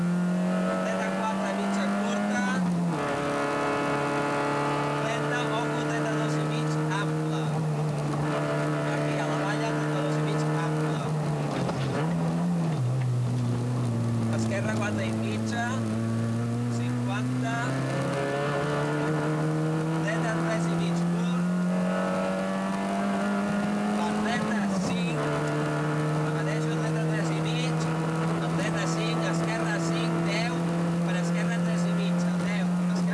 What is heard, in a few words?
motor